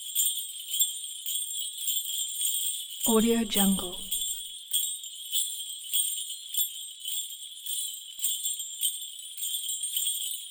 christmas loop sleigh jingle bell horse slow walk, 137; esmteam